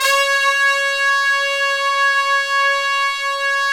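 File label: trumpets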